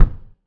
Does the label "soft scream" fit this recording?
No